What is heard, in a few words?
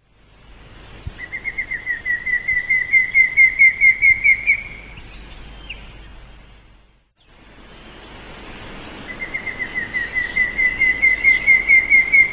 pallid cuckoo